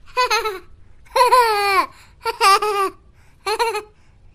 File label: baby laugh